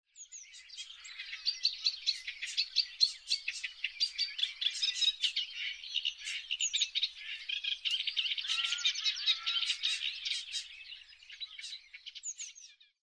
Saone, vogelwelt felder und waelder

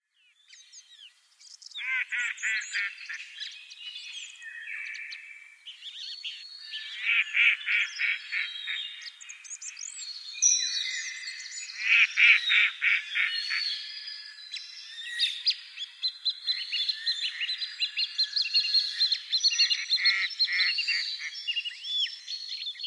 Vogelstimmenkonzert; heimische vogelwelt

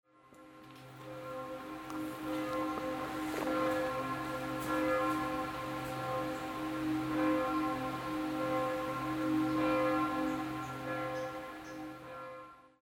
Andechs Kirchenglocken; Geraeusche

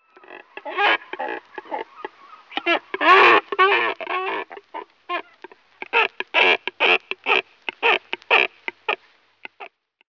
Kormoran Balz; vogelportraet; phalacrocorax carbo

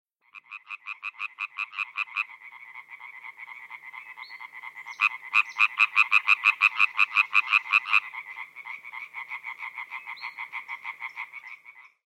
Laubfrosch; Froschlurche; heimischen froschlurche

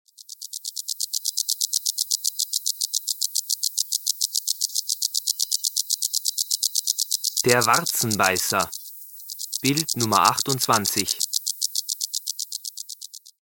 Warzenbeisser, heimische tierstimmen; saeugetiere lurche insekten

